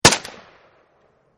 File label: AK47 Assault Rifle Single Fire; Guns; VRSonic